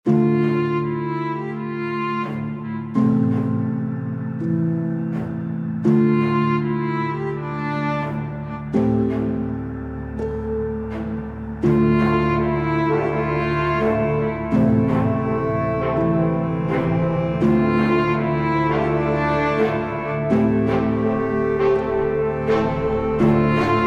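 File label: Powerless Master 83 D Minor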